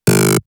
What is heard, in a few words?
lightsaber; The Death Star